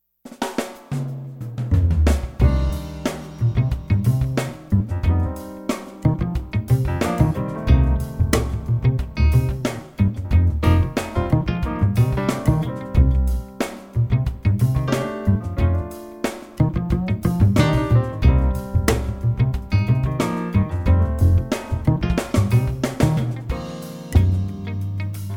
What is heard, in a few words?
corebass